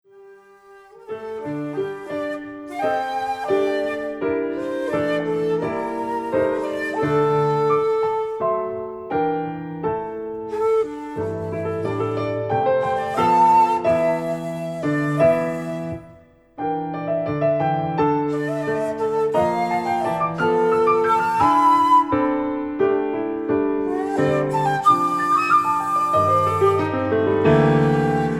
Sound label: shakuhachi, chamber